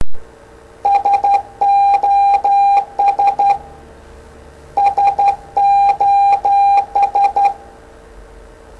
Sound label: Morse SOS